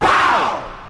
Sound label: "wow" crowd